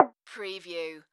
Multimedia Percussion

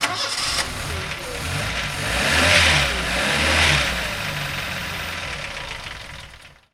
Test Car Engine

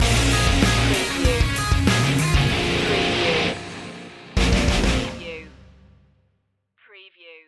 crunch time stinger

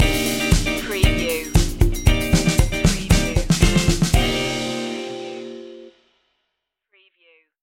soul fusion stinger

